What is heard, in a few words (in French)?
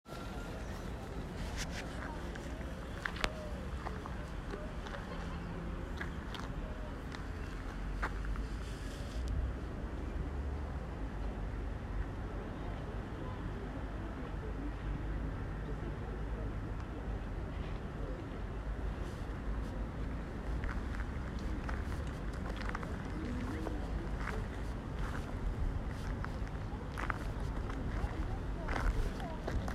Quai Rambaud